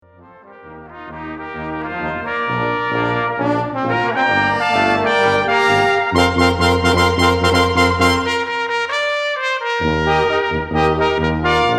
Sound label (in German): BRASSQUINTETT